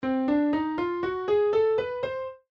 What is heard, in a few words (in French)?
Gamme octotonique